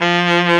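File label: big band fast baritone sax; Roland L-CDC-01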